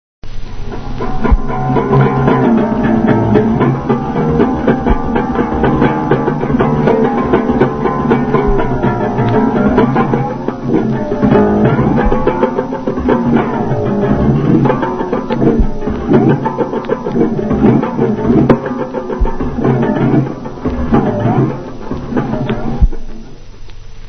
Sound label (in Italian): arco enarmonico; futurismo musica; russolo